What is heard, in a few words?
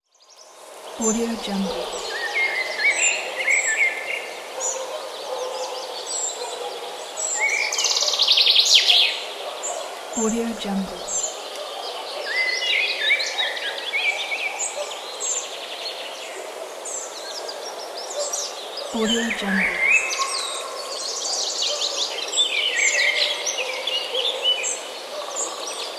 birds in forest